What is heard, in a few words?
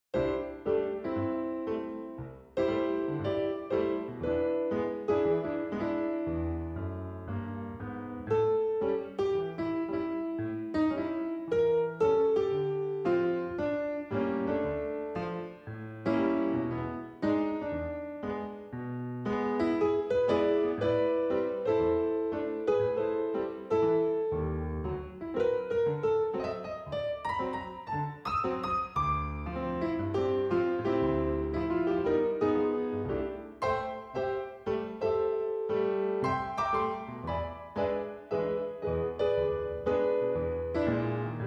piano